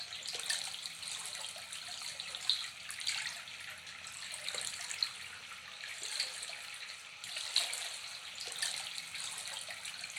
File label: Ambience pool; adams venture origins